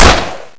mp5k fire; weapons; svencoop; aomdc

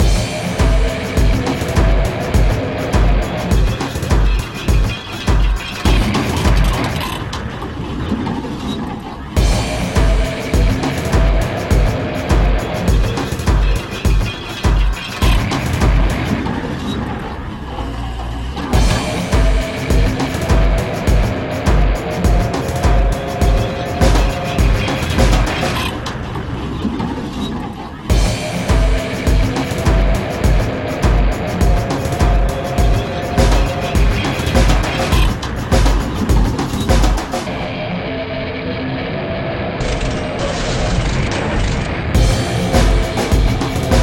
newsong loop; svencoop

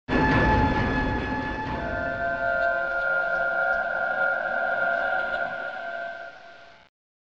music; svencoop; sence; mistake coop

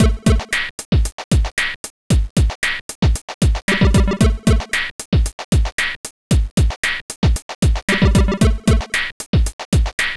loose theme; svencoop; sence

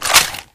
ar2 mag out; svencoop; hl2

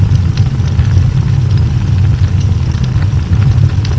fire loop; monsters; svencoop; aomdc; davidbad

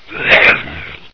attack; svencoop

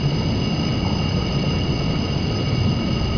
hiss; svencoop

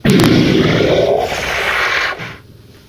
die; svencoop; custom monsters; overlordwizard

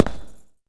tile; svencoop; AoMDC

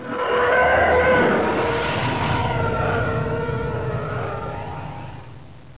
tie by; svencoop

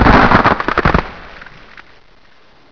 baby panther headbite; svencoop; custom monsters

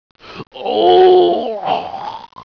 death; svencoop; Roy

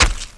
knife hit; svencoop

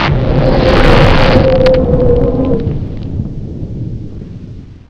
portal in; svencoop; st allies; lowell

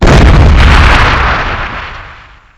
tow impact; svencoop; scshogo

